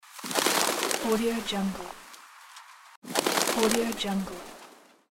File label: pigeons taking off